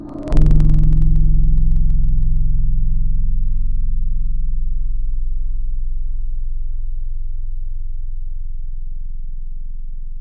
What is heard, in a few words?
Guitar Petit Acoustic; Instruments; Synthetic's Serum Collection; Echo Sound Works Core Tables